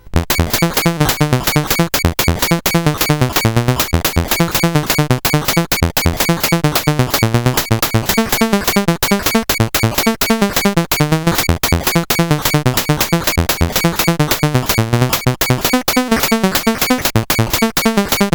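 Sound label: Moon Patrol, MOS 8580 SID chip music, games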